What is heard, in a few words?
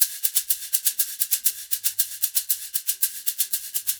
120 SHAK, Percussion Loops; USB Soundscan vol.36; AKAI; SHAKER